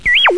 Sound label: computer